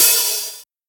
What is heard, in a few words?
treble heavy open hat d key